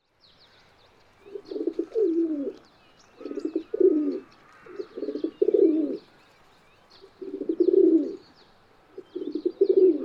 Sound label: Columbidae; کبوتر راعبی